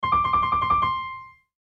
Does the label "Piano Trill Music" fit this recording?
Yes